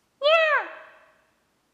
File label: pc nya